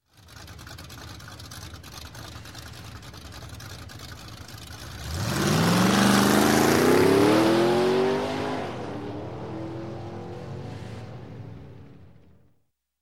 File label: Stock Car Drag Race; Sound Ideas; Series 2000